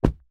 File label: step; chat grid